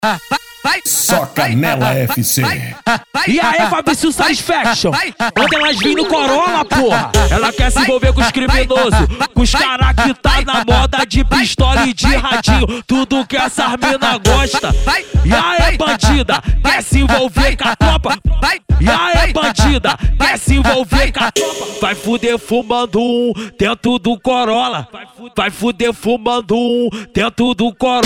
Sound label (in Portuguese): funk e lambadao